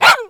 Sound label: Puppy Idle Bark; Warframe; Kubrow